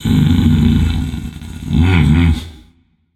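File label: non verbal agree, voice; cernunnos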